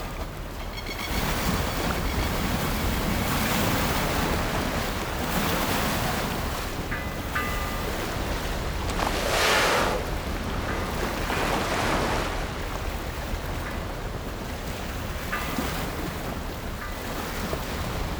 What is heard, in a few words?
ASMR